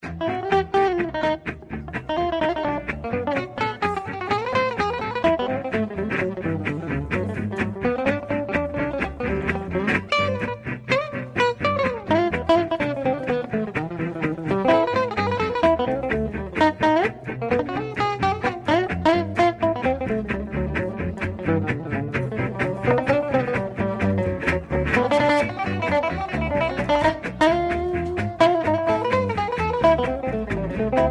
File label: Unknown swing